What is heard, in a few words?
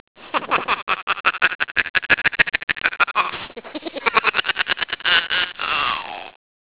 witch laugh